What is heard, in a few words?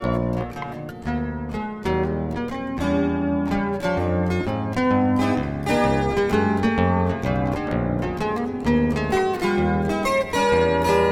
Jazz Exercise, Zither